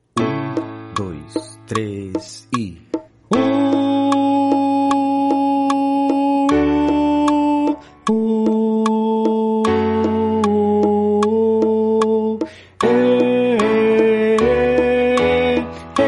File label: tenor